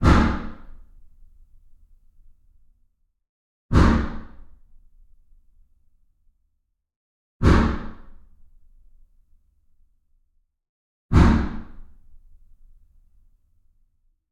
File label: Mossberg 12-Gauge - FIRING - Office Corridor - Single Shots - 20m Adjacent Room; Pole Position; The Indoor Gun Acoustics 2 Library